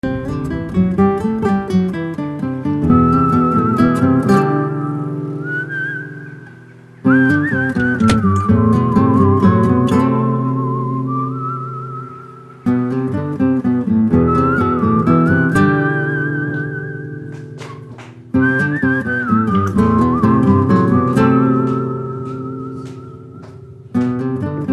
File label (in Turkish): islik; Cesur ve Guzel